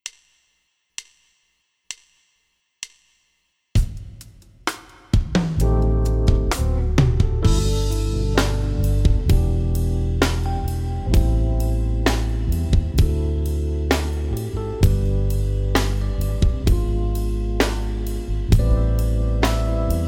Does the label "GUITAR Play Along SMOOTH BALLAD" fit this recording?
Yes